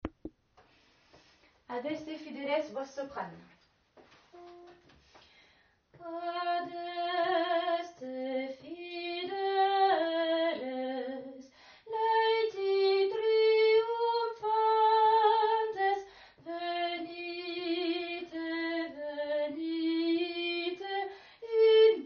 Soprano; Adeste Fideles

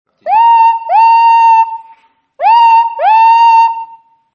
train

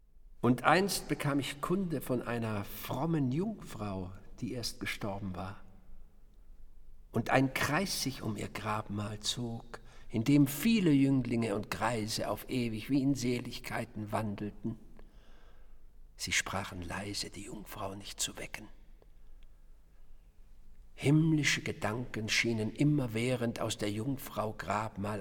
Schubert Unfinished Symphonies; NativeDSD Music

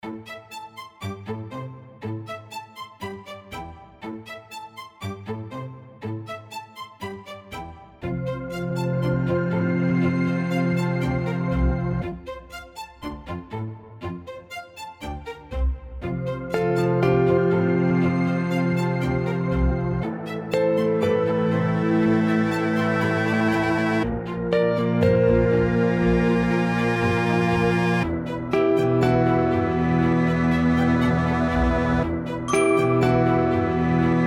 ensemble

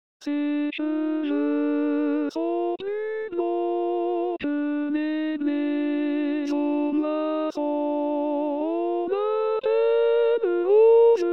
alto; rose d'or

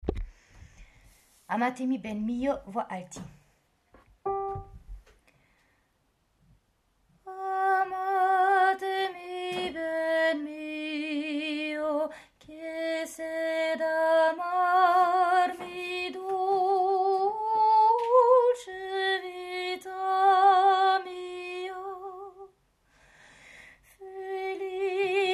Amatemi ben mio Mezzo

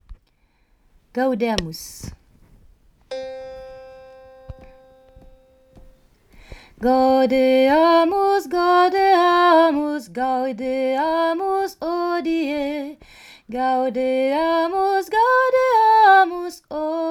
Gaudeamus

